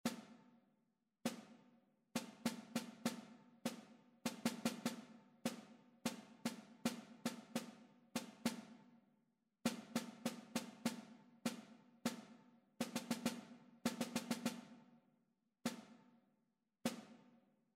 rhythmic error detection

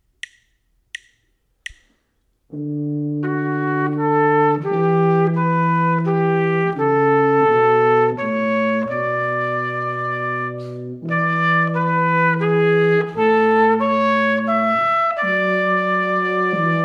two part melodic dictation; ear training

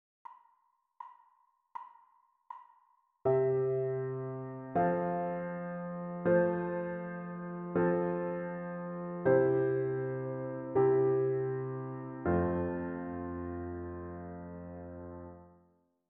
harmonic dictation

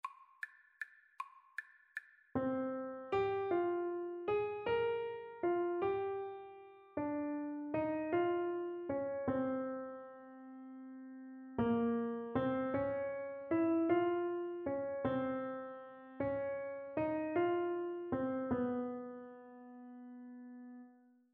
melodic error detection

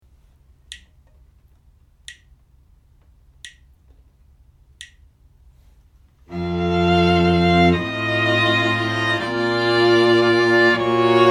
harmonic error detection; eartraining

